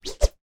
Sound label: sport racket tennis or badminton swing swipe; zapsplat